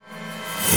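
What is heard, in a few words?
reverse Bash, Cinematic Metal Impacts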